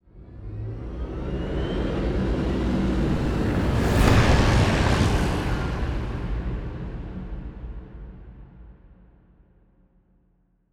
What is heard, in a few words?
ship cruiser move; Stellaris; toxoids; ships